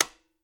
tick; cybermachine

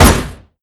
supershoot

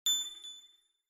star, lootbox

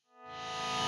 bgm forest intro

